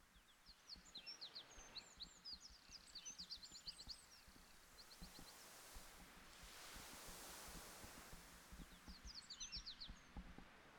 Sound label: Ambience Outdoor